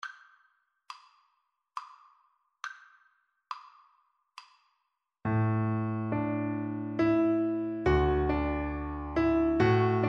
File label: double bass